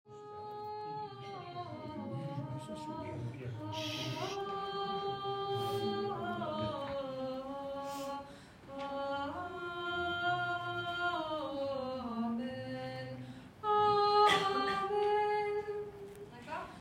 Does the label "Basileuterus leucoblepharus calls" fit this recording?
No